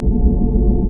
angel ice slug stand

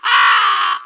toucan attack

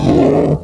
garden watcher damage

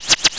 attack

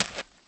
chicken move

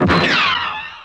defence

